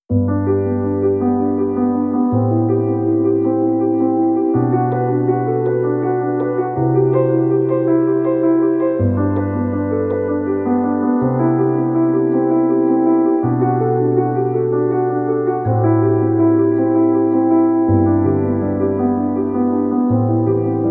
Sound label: romantic